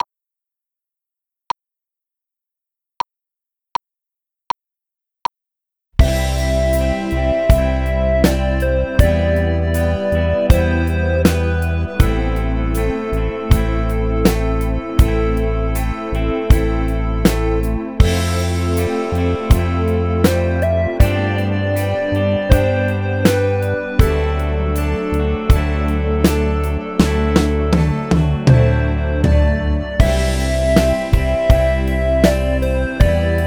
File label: Keys Drums